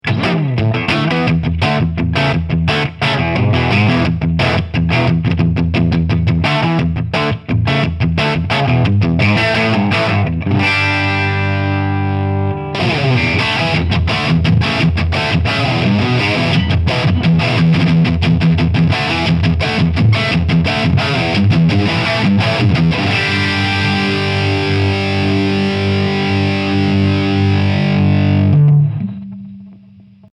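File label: Humbucker Bridge, guitar; Carl Martin Plexitone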